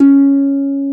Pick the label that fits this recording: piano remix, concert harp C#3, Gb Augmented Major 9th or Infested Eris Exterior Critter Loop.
concert harp C#3